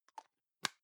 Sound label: plastic can lid screw close soft; objekte; dose